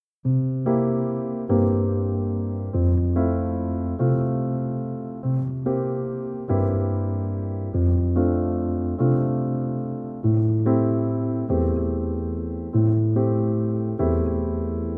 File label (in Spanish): Piano Suave Relajante